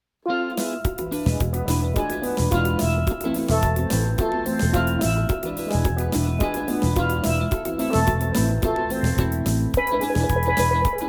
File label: steel band